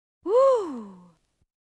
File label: ohhh; English Prep Package; TheFishWhoWished